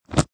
Sports Bowling Bag Pick Up; Bowling Sound Effects; Lynne Music

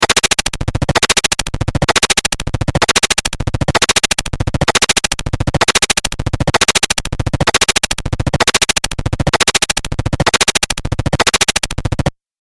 8 Bit Style Repeating Machine; Video Game Sound Effects

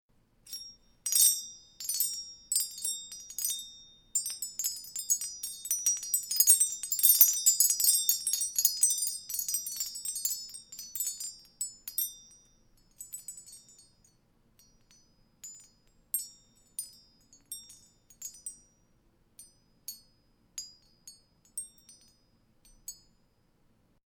Wind Bell, Tinkling bells in glass windchimes.; Bell Sound Effects; Bells (Sound Effects:Musical)

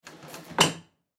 Ship Drawer Wood Under Bed Close; Transportation Watercraft; Boat Sound Effects; Blastwave

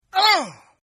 Grunt; Grunts; Voice; Partners In Rhyme

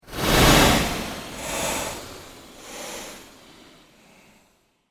PULSE LONG SWEEP; Sound effects created by manipulating other sounds; Sweepers; Sound Design

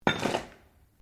METAL ON GRAVEL IMPACT GENTLE; Metal (Sound Effects:Materials)

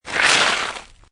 Snow And Ice Wet Slush Slide; Weather

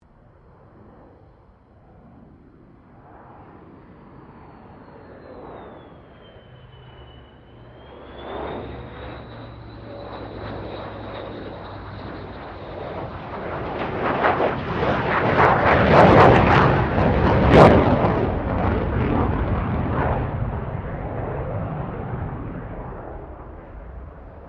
AIRCRAFT JETFIGHTER F-111 PASS; 1973; F-111 Jet Fighter Bomber; Airplane Sound Effects; Airplanes (Sound Effects:Vehicles)

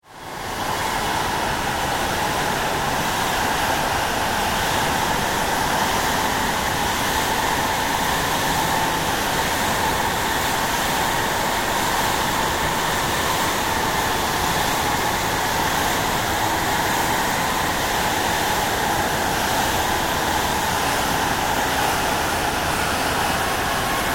Bed Airy Swirls, Production, Element; Beds; Sound Design; Blast Wave

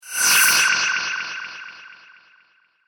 Mysterious Illusion, magic sound effect; Fantasy sound effect; role playing games, magic videogames

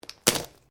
Branches Dead Snap

